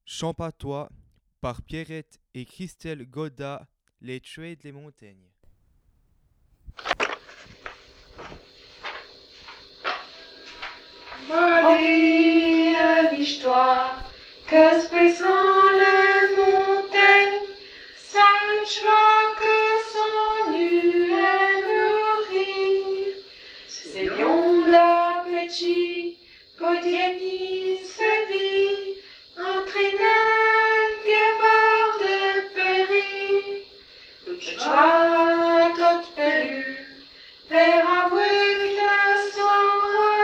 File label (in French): Chant patois; Lai Tchva d lai Montaigne